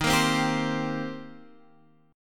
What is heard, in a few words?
Eb 6th Eb down Guitar Standard; Eb6 strummed; JGuitar